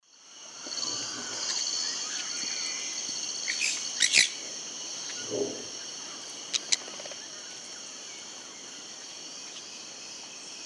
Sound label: Pyrrhura melanura, group calls; S. America; Eastern Lowlands